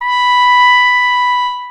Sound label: 1000 Hz